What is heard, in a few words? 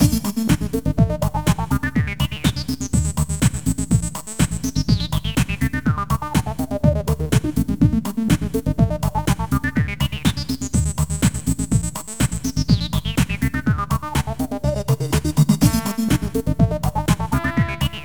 Corporate